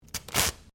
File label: Sticky Tape Rip; common tape; Offices